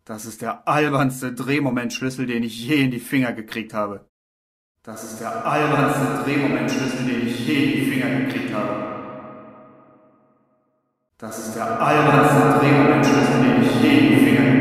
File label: reverb